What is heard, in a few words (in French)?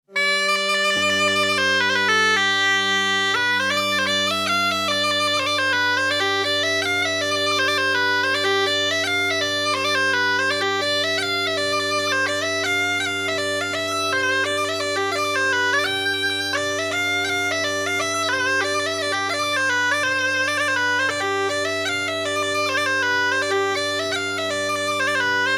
Mazurka; Mazurka de Chaput